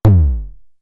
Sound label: BDrum, Drumfire DF-500